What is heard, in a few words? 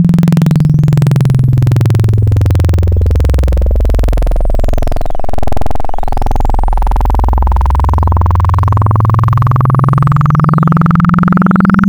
Sine Formant, wavetables; Cymatics; Wavetables Collection Vol 3; Serum